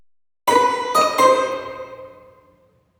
Ominous plink Orchestral 127bpm; Ultimate Everything Music Bundle; Stingers, Blips and Dings

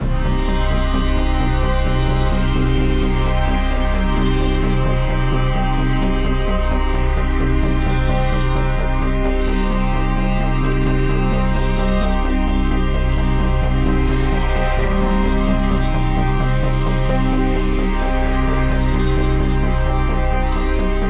ElecAmbient; Electronic Ambient Music; Electronic Ambient Music Pack; Jupiter (RT 5.39)

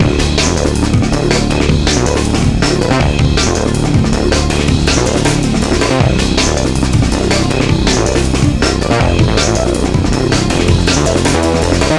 Dungeon Combat (modern, 160bpm); Ultimate Everything Music Bundle; Loops for All Occasions

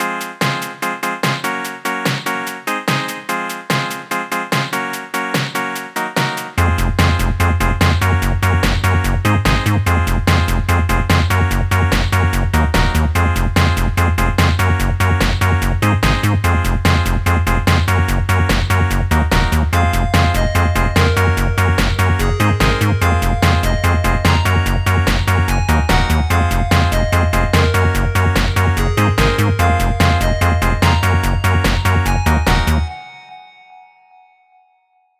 Chiptune; Chiptune Vol 3 Music Pack; Candy

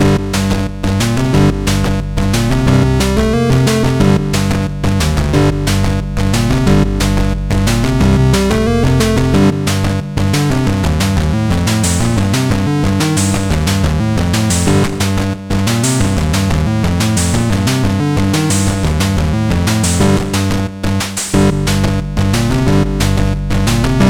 Retro Action Loop; Retro Action Music Package (Mixed Systems)

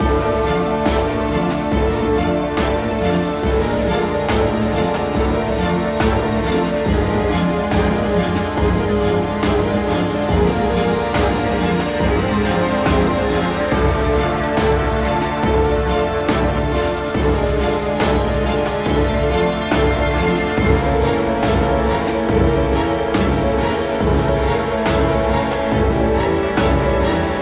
loop, Survival Metroidvania

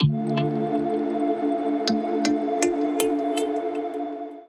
jingle sad short; Survival Metroidvania Vol1

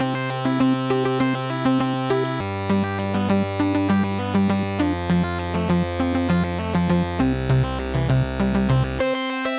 Retro Between Levels Loop; Retro Victory, Defeat and Briefing (Mixed Systems)

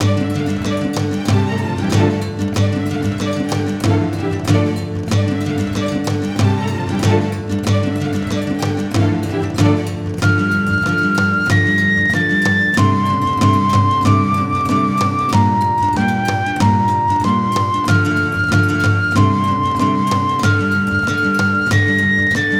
Street of Cairo; Chronicles of the illusion world part 2; Dark Fantasy Studio

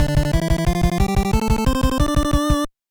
Victory, Fanfare; Megabit Tracks

